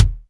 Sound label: WARM KICK